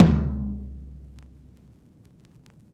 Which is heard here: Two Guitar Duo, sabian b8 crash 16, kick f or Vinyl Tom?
Vinyl Tom